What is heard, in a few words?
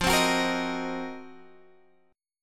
C Minor 7th Flat 5th F, chord; Cm7b5/F; strummed